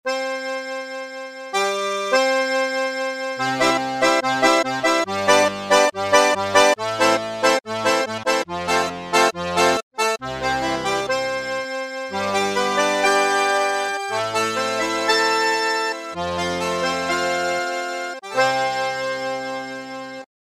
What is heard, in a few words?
G70 Accordion